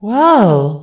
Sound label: whoa; rosalina; SM64 CO-OP